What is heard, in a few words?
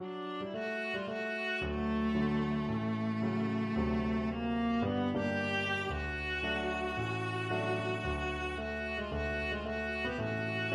viola